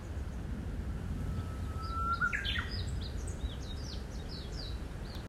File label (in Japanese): ウグイス